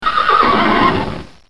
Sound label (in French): Cheval huhu, Animaux; bruitage